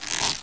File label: rope creak; Ferazel's Wand Demo